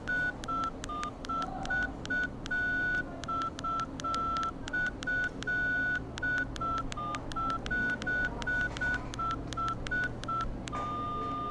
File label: Mobile